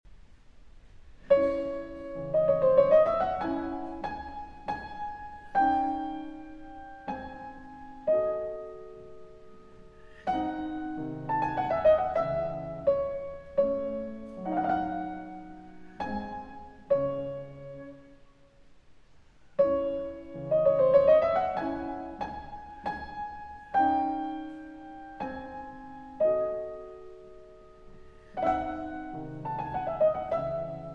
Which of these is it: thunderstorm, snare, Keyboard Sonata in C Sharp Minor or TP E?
Keyboard Sonata in C Sharp Minor